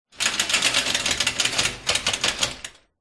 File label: industrial chain medium movement scrape hoist over metal light room reverb